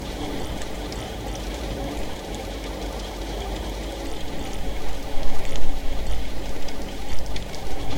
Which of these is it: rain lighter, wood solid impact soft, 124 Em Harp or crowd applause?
rain lighter